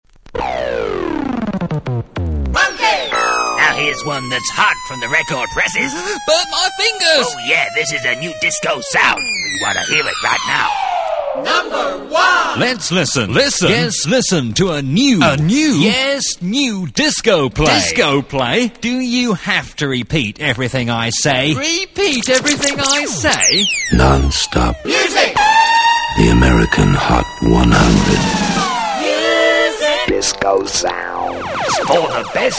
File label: jingles